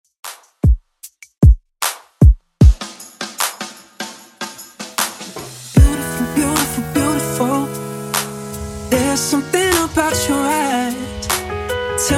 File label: Top40 Redrum 76bpm